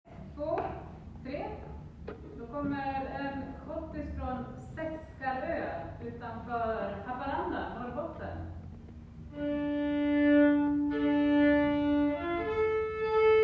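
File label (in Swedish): schottis övningstempo; Seskarö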